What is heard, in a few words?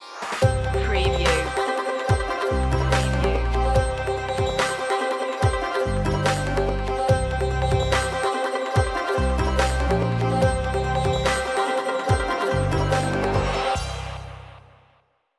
indian chase